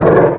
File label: death junglestalkers, creep deaths; dota